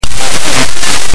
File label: loud chinese rap